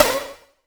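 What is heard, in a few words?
snare drum; Zero-G; Chemical Beats; SNARE ZOO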